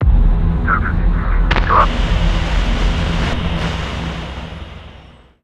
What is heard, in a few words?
comms cutoff; Stellaris; nemesis